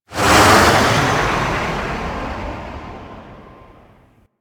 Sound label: Dropship Hover Start; Warframe; Vehicles; Grineer Drop Ship